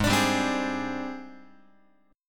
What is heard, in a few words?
G sharp Major 7th Flat 5th G sharp, down, Guitar; strummed; 4A,4E,5A,5D,5G#,6C#; G#M7b5; x,4,5,5,4,7